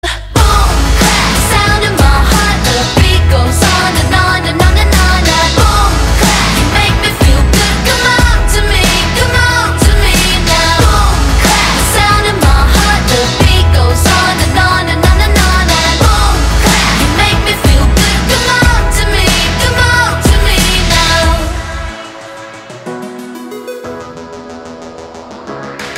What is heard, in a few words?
ringtone